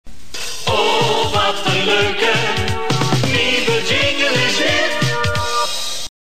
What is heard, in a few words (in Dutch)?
jingle